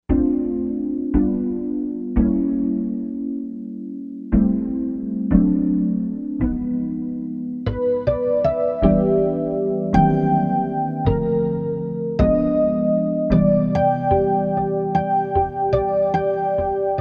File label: Harmonics, Korg M1Rex; Factory Patches